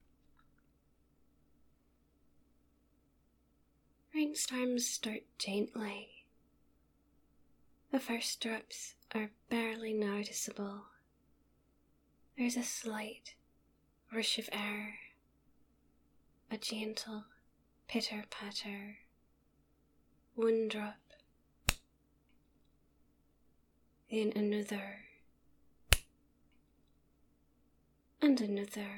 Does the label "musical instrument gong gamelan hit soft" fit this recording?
No